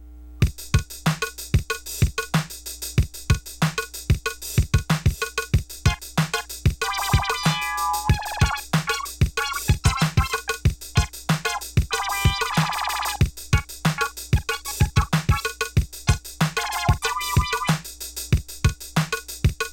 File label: woop